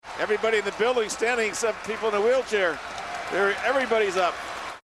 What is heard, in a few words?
wheelchair